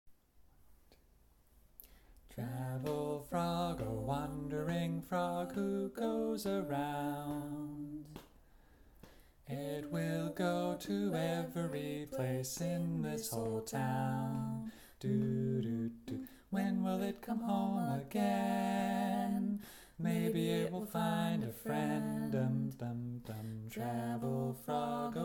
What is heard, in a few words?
travel frog